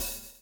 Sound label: swing